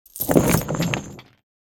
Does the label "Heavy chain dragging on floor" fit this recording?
Yes